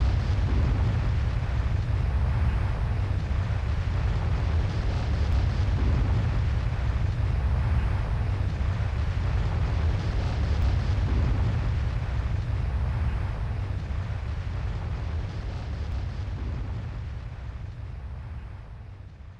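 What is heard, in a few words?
Quake Vibration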